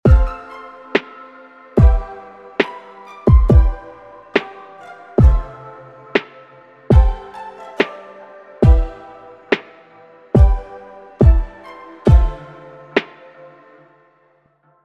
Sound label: Chill